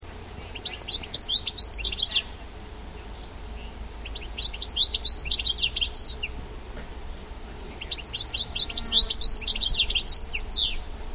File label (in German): stieglitz